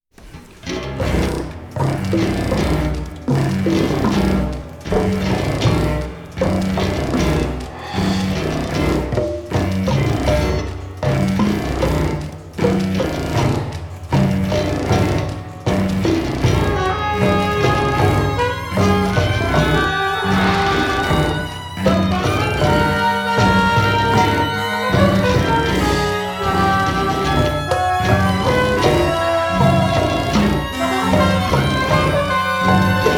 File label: Gospel Train (orchestra)